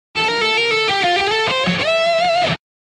level up fanfare; rwby grimm eclipse

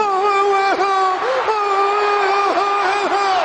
crying; the rock

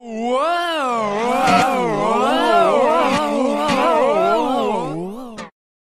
wooaooaaawoaaah

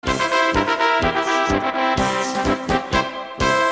trumpeta

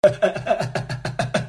ahahahahaha

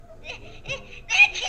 operation sneeze sound

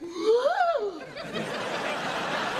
gasp laugh

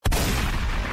movie shotguns

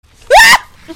mad scream

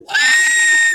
goofy scream; goofy ah

